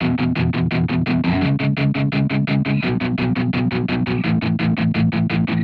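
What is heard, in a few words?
Guitar Punk E major 170 bpm; Guitar and Bass; Ableton; Factory Packs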